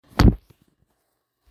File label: stamp